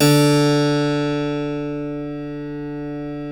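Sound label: D2 clavinet right, Keyboards Acoustic; USB Soundscan vol.17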